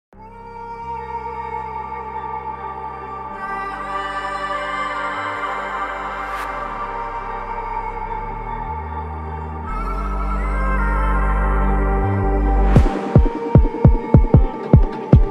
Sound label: Jersey Club